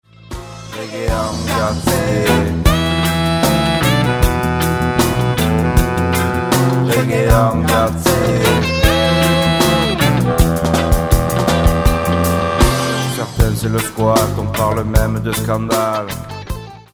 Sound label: reggae